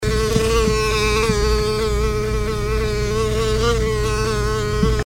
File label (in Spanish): mosquito